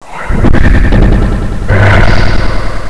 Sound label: skeleton wait; monster; wizardwars